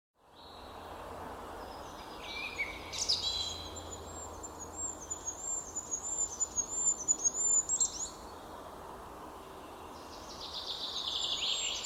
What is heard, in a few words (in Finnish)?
hippiainen; linnut